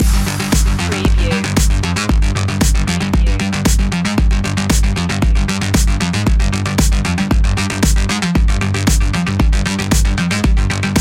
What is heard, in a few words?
electro circuit loop